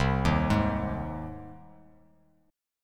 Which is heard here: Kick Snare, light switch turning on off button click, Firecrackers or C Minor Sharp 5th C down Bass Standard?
C Minor Sharp 5th C down Bass Standard